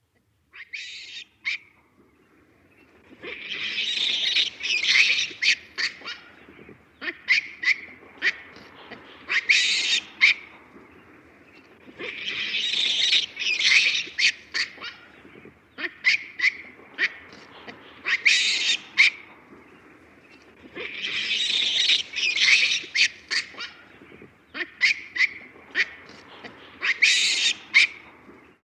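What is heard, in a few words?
Baboon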